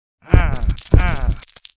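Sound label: Mob attack; Bossmob; 금전방; 소안금낭귀; 2단계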